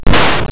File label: Close; Warlords